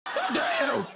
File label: scream